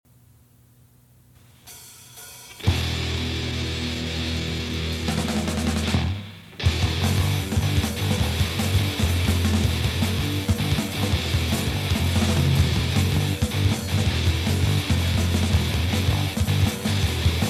Batida Policial

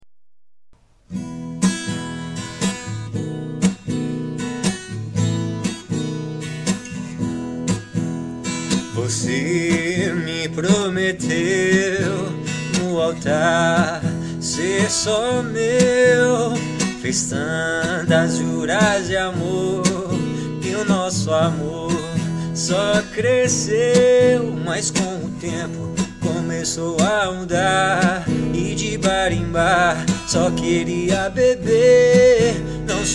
voz e violao

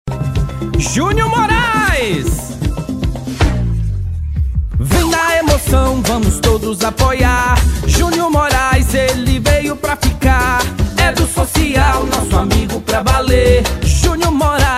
jingle, Jingles Politicos; pisirico; pre campanha; vereador prefeito eleicao campanha politica 2024

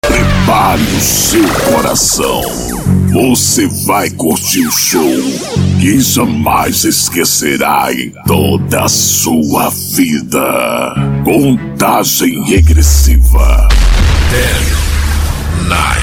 abertura, Acordeon

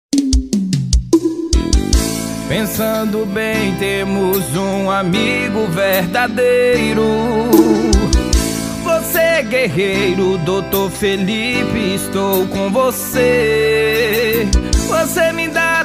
Jingle; pre campanha 2024; jingle vereador prefeito; eleição; campanha política

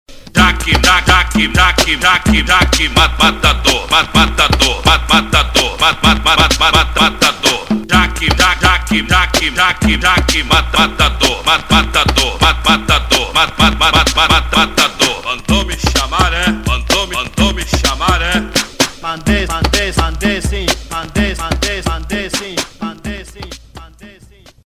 toque para celular

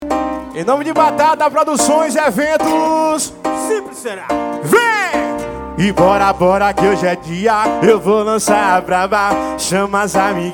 samba

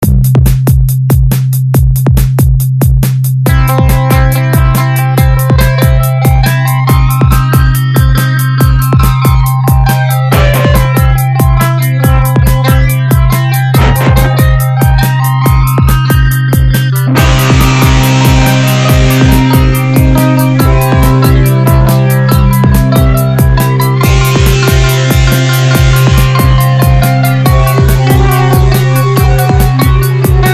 instrumental

